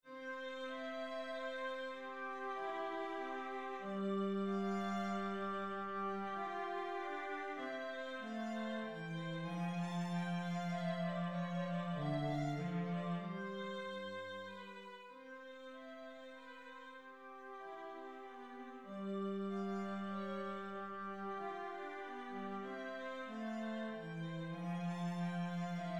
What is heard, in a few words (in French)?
menuet